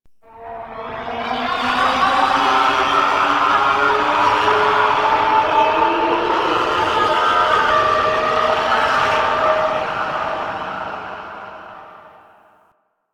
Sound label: Shrill Screams; Ambience; Man In The Wall